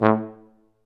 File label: F TUBA, Solo Brass, Tuba stacc